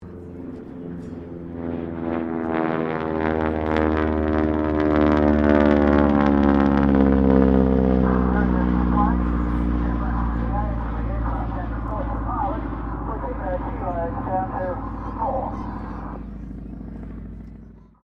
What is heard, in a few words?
AIRCRAFT AT-6D HARVARD MK III PASS PILOT COMMENTARY; Harvard Training Aricraft; Airplanes; Vehicles